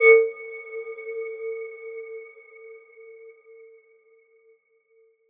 Aurora C5 f; HSS-Custom-1; healing soundscapes

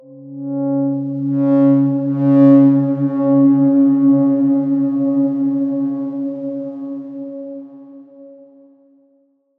Darkswarm C#5 f; Ableton; healing soundscapes

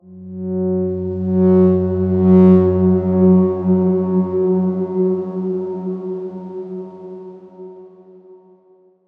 Darkswarm F#2 mf; Ableton; healing soundscapes

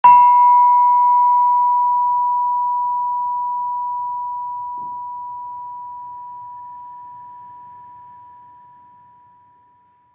Gender B4, Gamelan; HSS Gamelan-Pack1; healing soundscapes

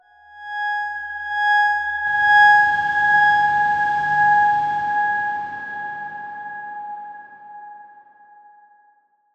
Darkswarm G#5 mf; HSS Ableton Pack1

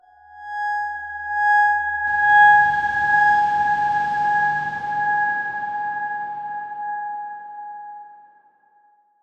Darkswarm G#5 f; HSS Ableton Pack1

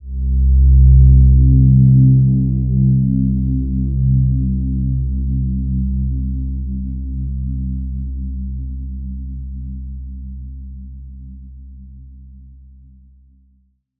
Silver Gem C2, healing soundscapes

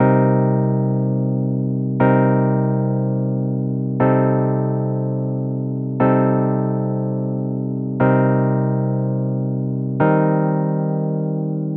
Airy Rhodes; healing soundscapes

